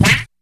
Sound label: punch; rockem